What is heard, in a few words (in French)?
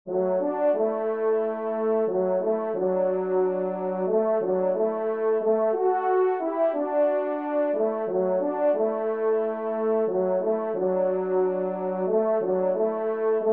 TRP; Fete au village; Poule